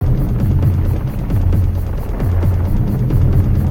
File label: level; Indiara And The Skull Gold